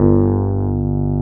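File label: Wurlitzer G1 - L; USB Soundscan vol.09; AKAI; Keyboards Old School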